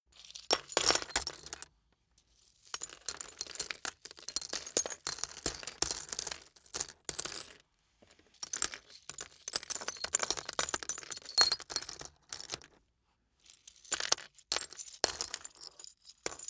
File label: glass debris fall sequence long